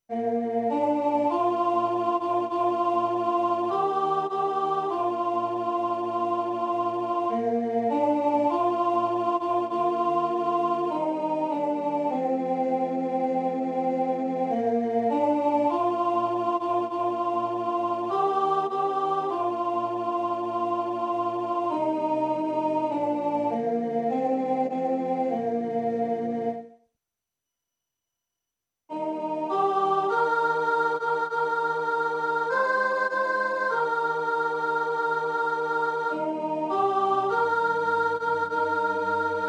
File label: Soprano, rehearsal